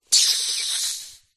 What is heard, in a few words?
cola bottle open lid hiss; zapsplat